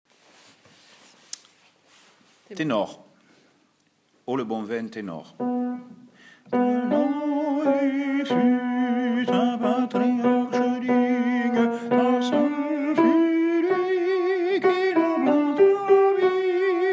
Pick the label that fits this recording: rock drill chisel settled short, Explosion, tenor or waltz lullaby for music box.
tenor